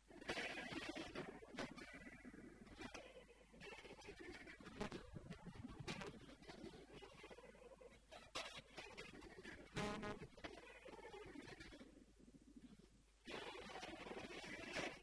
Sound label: JMI Tele